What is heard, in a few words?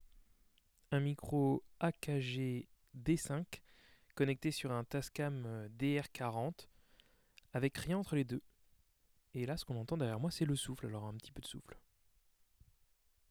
akg sans fethead